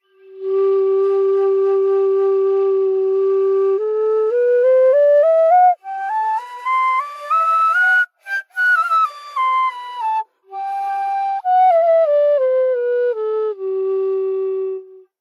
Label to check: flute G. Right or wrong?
Right